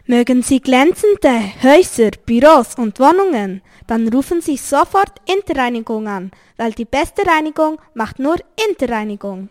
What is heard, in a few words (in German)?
Werbejingle; Interreinigung; Unterseen